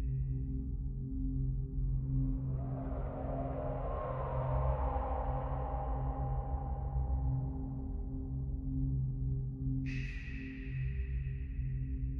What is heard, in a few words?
Splash Loop; divine megaways